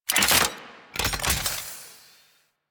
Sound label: Rifle Mech Alt Fire Return; Thanotech